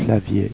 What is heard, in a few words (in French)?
CLAVIER